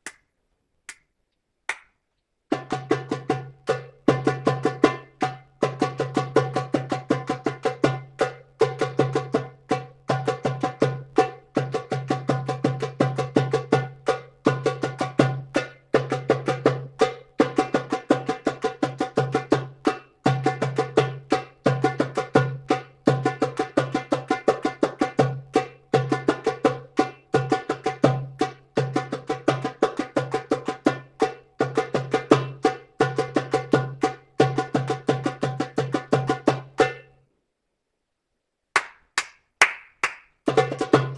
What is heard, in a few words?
New drumming patterns; WBPE Ethnic Dinner Performance